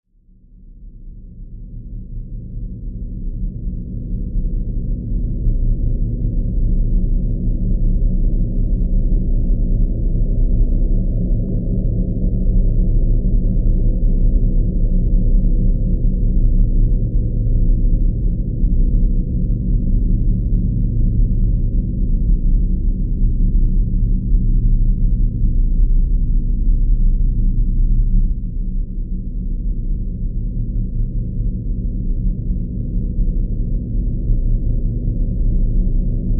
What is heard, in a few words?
Space Drift Moog Generated Space Shuttle Ambience